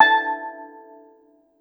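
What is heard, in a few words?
STEEL DRUM; E-MU Producer Series Vol. 7 – Old World Instruments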